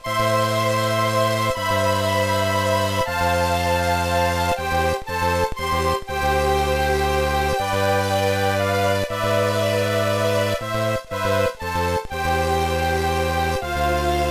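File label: background music; No No No Puzzle Chailien; GBA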